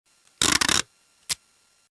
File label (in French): outil; MOBICLIC161